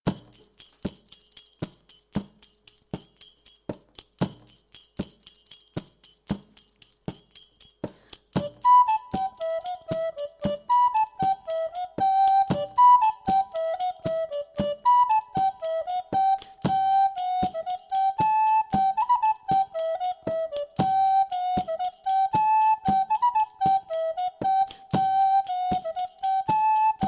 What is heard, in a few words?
Maraichines Patte Folk